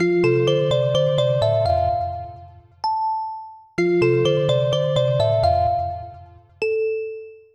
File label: OutgoingCall